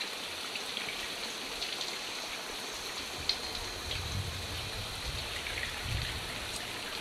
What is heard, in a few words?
Ambience puddle